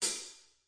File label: hat, DRUMS, REAL LIVE KITS; Osama Qureshi DrumKit